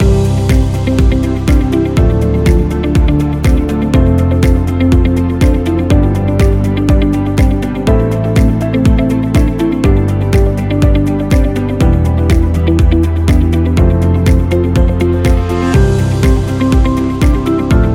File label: Educational Loop